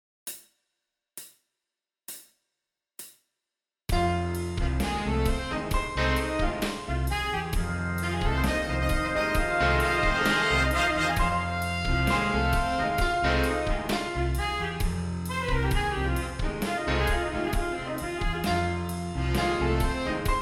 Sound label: Horns in Fifths